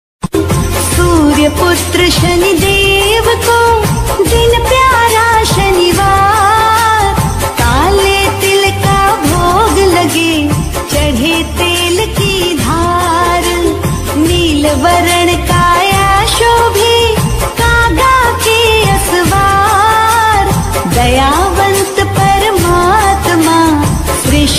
bhakti ringtone, shani dev ke bhajan instrument ringtone